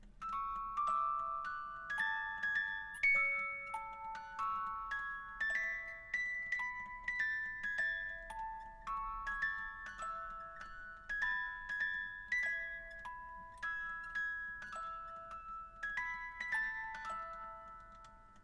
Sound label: Hymne Belgium